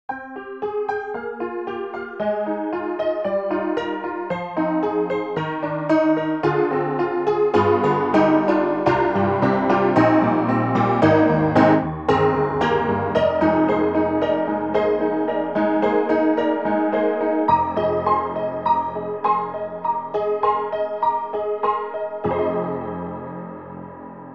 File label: Ragtime